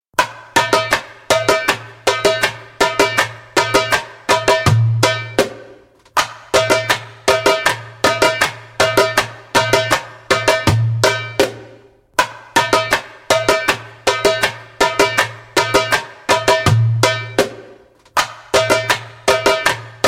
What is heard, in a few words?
Tickling Ticks (slow) spoken, Djembolale; Djembolale - Fills and Drills